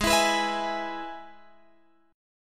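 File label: Ab Minor Sharp 5th chord, Guitar, Drop D tuning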